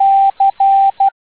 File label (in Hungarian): Távíró c